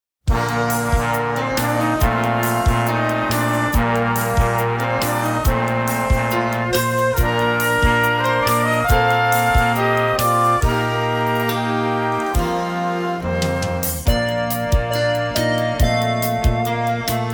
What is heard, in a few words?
Exit Instrumental